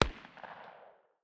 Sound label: pistol 45 far distance, distant 45 acp; tacz-tweak-pack; taczmechanics